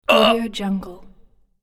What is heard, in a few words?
human character ahh